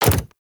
Land Default; Warframe; Pets; Zanuka Companion

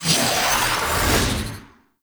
Distill Ability Cast; Warframe; Warframes; Alchemist

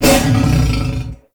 Wolf Bite; Animals; Duviri

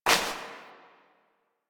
Step Dirt; Warframe; Koumei

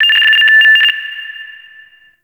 Interior Orbital Station Telemetry High; Warframe; Tau

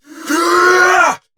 Attack Effort; Warframe; Dax; Dax Ashigaru; Dialog Barks Battle

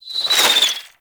Bow Arrow Rain Whizz By; Ultimate Abilities; Madurai; Warframe; Focus

